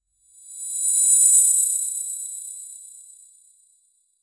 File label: 10 second outro sfx for a, beauty